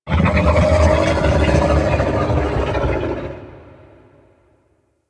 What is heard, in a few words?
Move; Golden Maw; Warframe; Ambience; The War Within